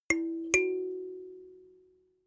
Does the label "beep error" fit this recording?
No